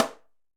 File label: Timbales C H Mute, Latin Percussion, Drum Hits; Ableton; Factory Packs